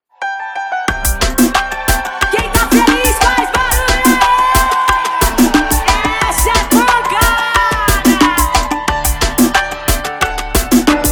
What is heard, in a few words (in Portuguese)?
na batida reggaeton; open bar